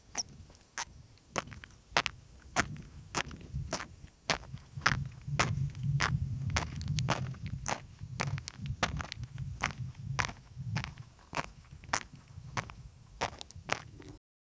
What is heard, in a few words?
the sound of a lion, rubber duck